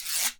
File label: guiro, Synclavier Percussion, World & Orchestral